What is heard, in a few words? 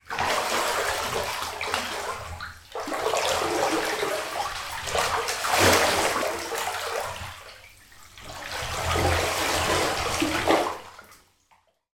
household bath water person move create waves